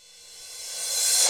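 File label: Roland R-8 backwards cymbal; Internal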